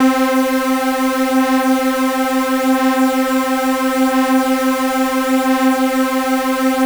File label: LEAD (MASSIVE - AN AIR OF DETUNED LEAD C5 NOTE), Synths